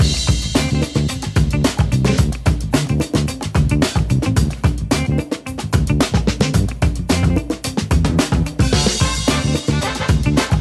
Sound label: music fs; riviera Star Desktop; Fantasma